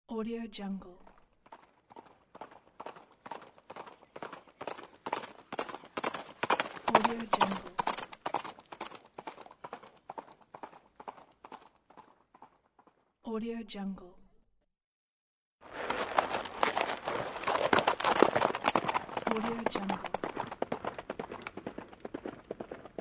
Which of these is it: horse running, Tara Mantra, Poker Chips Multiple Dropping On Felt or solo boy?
horse running